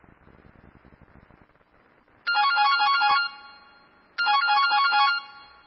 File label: Classic Phone